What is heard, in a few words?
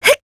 jump; ayaka